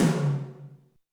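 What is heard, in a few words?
tom, real mega drums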